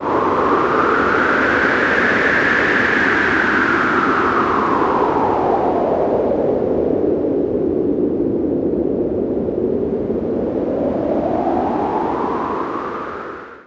Sfx, Trance; Zero-G Trance Inducer; General Sfx

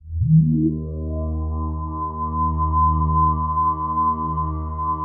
EB2, KORG DW8000, Vintage Keyboards; Big Fish Audio - First Call Vintage Keyboards

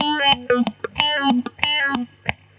GTR, Roots Funk; USB Soundscan vol.41; AKAI

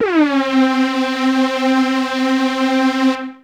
Techno leads, hits and riffs

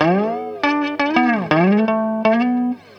HAWAI, COUNTRY; USB Soundscan vol.31; Miro s Grooves; AKAI

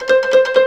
M1 KOTO TREM; STRINGSYN; Alpine Volksmusik

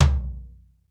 RUDE TOM L; R8M TOMS; East West; Global Sounds

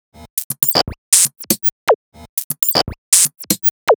Odd Glitch, 120 BPM, Percussion

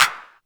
claps, techno; Techno ID; single-sounds; single-drums

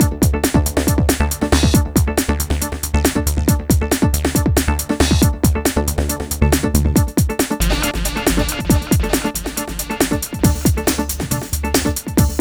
Hardcore Breakbeat, 138 Dmaj; Big Fish Audio; Hardcore Breakbeat Action

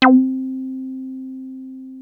303 C4, TB 303; Hollywood Edge; Giorgio Moroder Rare Synthesizer Collection

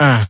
vocal shot f; HIDEO

